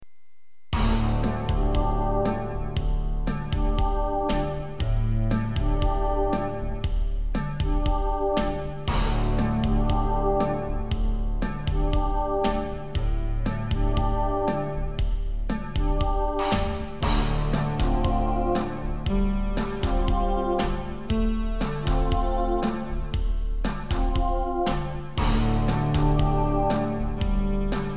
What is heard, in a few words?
Creative Sound Blaster AWE64 hardware; soundcardrecordings; Abuse